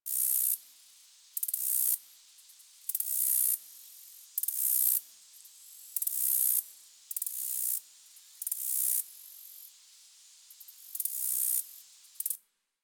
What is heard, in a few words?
Single Cricket Loop; Dagath; Warframe; Ambience; Clan Dojo; Dojo Misc